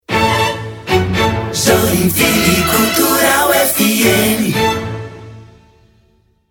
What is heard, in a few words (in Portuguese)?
VINHETA CANTADA